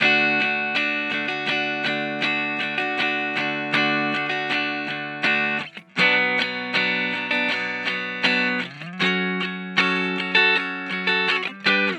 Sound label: D Dry Guitar Chords, Dream Pop Guitar; CherryPie; Guitar Hypnosis